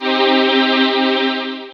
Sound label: dance chord; instrumente